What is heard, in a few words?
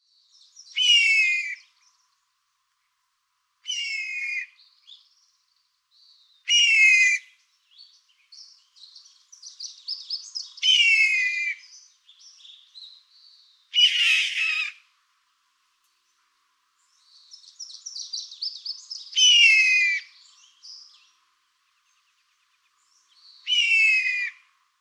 Steller's Jay